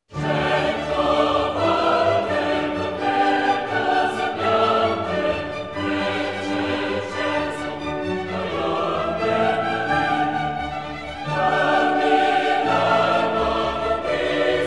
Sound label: Coro; Cantata Di OMaggio; Cento volte con lieto sembiante; Mozart; Il Sogno di Scipione